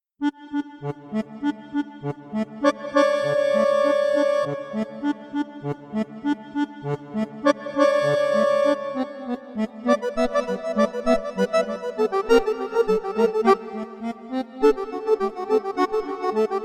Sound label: Train Trip Rag